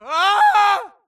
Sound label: grunt death crush; Titanfall 2